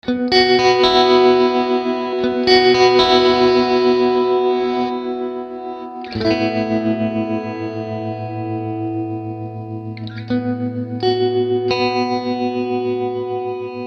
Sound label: Guitar Distancer; M4L Bulbs; Rene Margraff